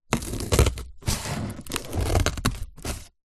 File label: office scissors cut cardboard several snips